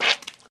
zombie

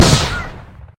skill3 bomb

